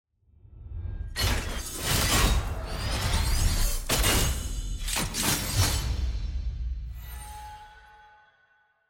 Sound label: level up; champion mastery